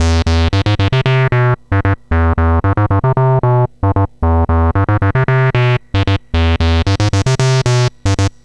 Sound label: C64 Bass, Commodore 64, Chiptune